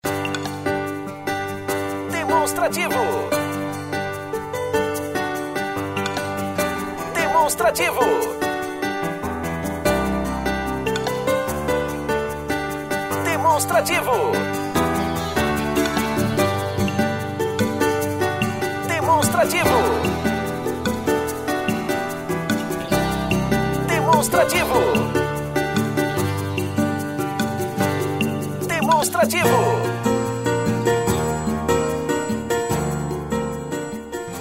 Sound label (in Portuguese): tema espera